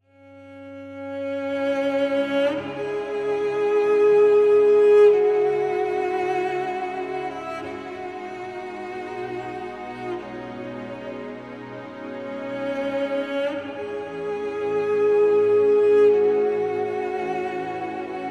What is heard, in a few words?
CELLO